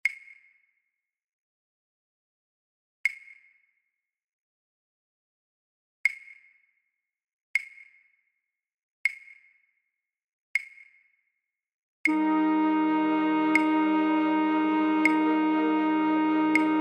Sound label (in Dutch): fluitorkest Fluit; voor oefentracks; Matthaus Passion